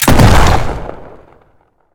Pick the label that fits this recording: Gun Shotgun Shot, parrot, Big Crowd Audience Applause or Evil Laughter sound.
Gun Shotgun Shot